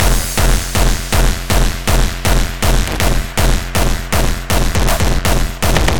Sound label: Beats Loop; Anger Foot